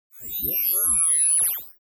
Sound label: ui scifi interface sweep